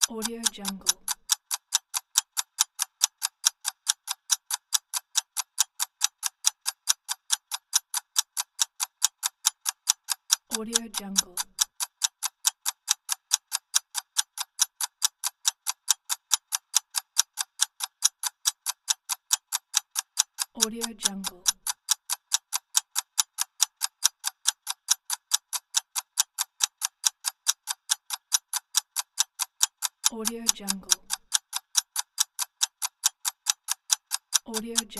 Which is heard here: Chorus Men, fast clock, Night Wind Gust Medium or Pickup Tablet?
fast clock